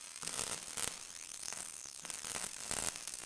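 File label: bad sparks loop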